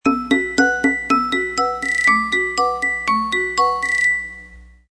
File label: Gentle Tone; Samsung SGH-i710